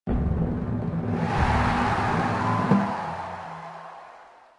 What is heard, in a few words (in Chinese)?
战斗胜利士兵的欢呼